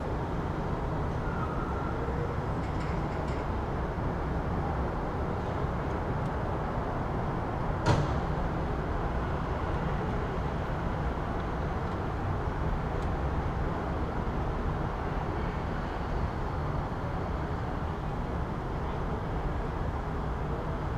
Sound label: Minutes Of City Sounds